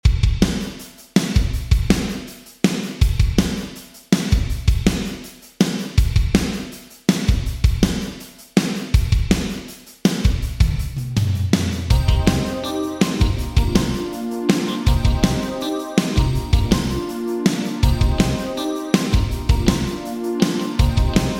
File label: Drums Lexicon 300 Rehearsal Room Vintage Digital